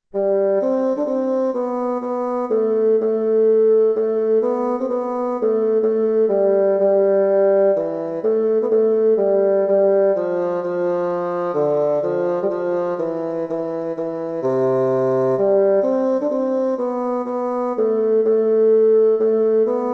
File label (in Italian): melodia; Verdi